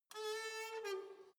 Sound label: letterbox metal push close soft